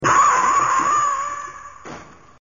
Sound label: Aaaaaah